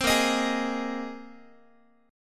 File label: A sharp 7th Suspended 2nd Suspended 4th A sharp Guitar; strummed; JGuitar